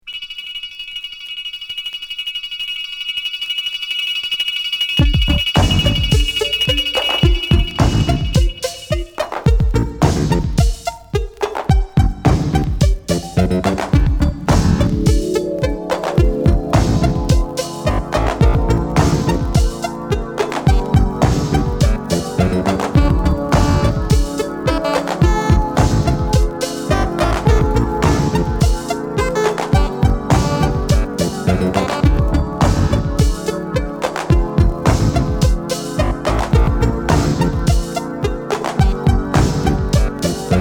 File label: musical computer